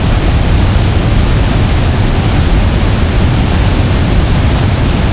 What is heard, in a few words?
water fall huge close loop